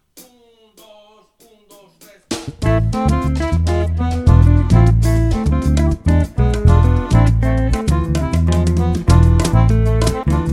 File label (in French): ballade; rabouine